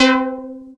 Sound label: Synth Sound; Vengeance Samples; VEE Synth Shots